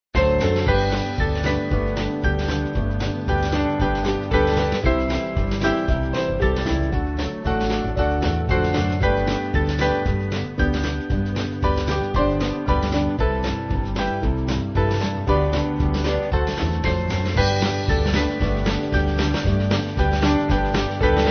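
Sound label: African Band, Music Backing; Oh Freedom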